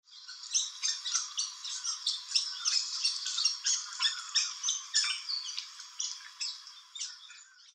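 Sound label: black-naped oriole, tokyo; koe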